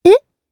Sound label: combo break; stella kii